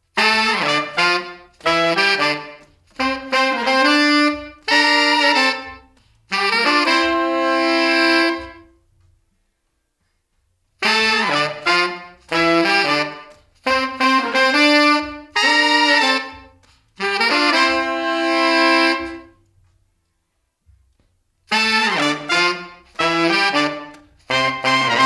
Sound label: Horn Section LXP Room